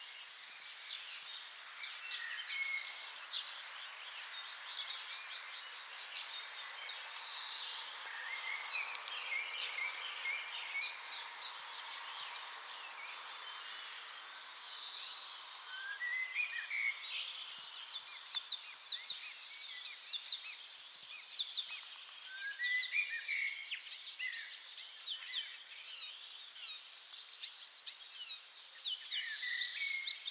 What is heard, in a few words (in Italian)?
canto codirosso